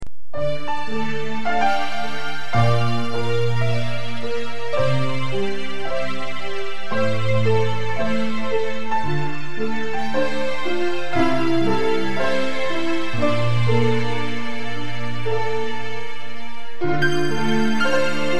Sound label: Piano